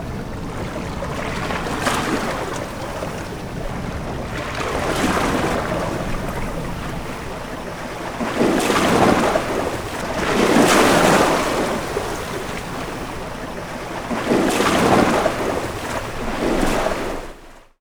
boat sailship waves; best service Blue Box; sea traffic

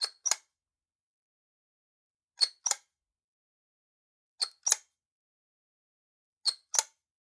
Generic Button Plastic Tiny

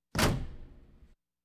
Automobiles various

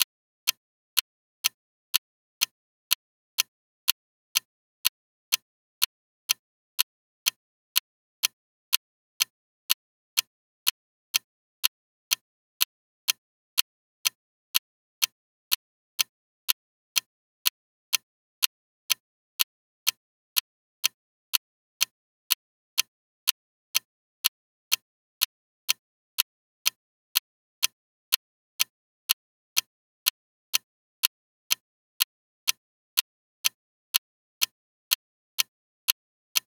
Clock Ticking; Clocks, Watches and Timers; Blastwave

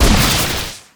Explo Small Web; Destruction

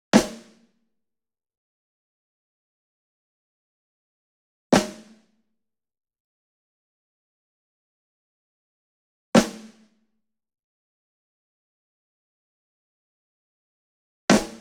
ENSEMBLE SNARE Group Hit Near; boom library; Cinematic Strikes Construction Kit

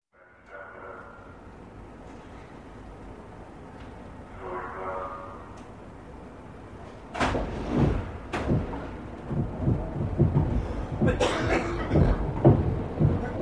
Train, Diesel Riding Train Into Station, stops; disembark; Sound Ideas; Series 2000

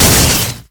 Explo Electric; Destruction

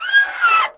Scrape Metal On Metal

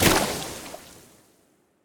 Water Enemy Footstep; AudioWizards

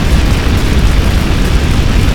Flame Thrower Shot Loop; Weapons

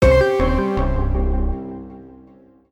MUSIC EFFECT Platform Negative; stereo; Universal Sound FX; MUSIC EFFECTS

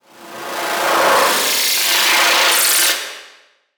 Static; Blastwave

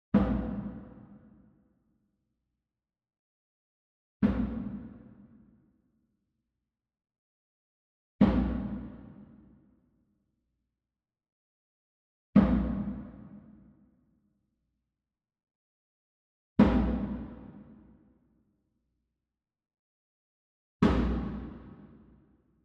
SMALL DRUM Tabla Hit Soft Beater Far; boom library; Cinematic Strikes Construction Kit

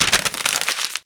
Paper Wad Up; Office Supplies; 1001 Sound Effects

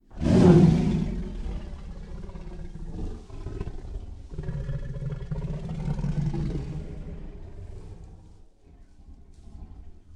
Long Roars and Snarls, Tigers; Sound Ideas

